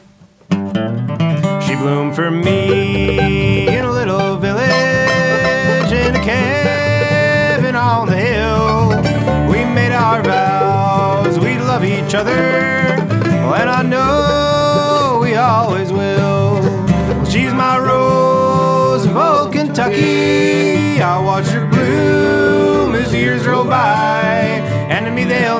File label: Rose Of Old Key G